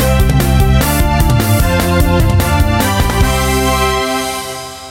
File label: Win music